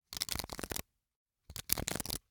Hwatu, card game, hard plastic, small, clatter, stack, drop, slide, double; Eiravaein Works; Vaeyan IV